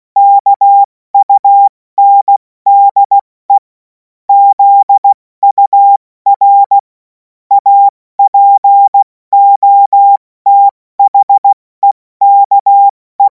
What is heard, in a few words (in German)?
Witz, Morsekurs, cw morsen; hamradio; cw witze